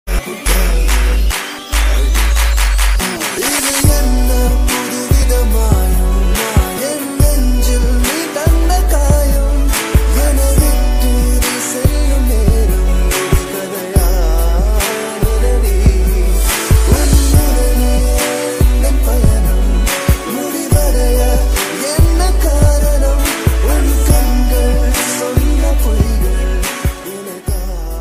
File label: bgm music